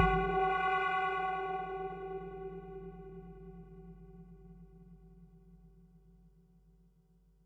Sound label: Perc, Percussion and FX; Pearl SC-40